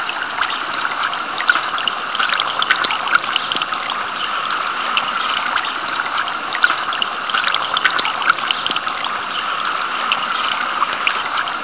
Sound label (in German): Brunnen; Hlg dreifaltigkeit; Veitshoechheim